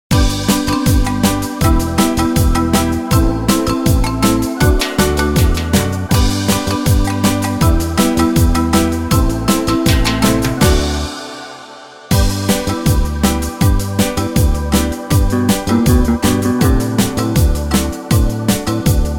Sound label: brass minus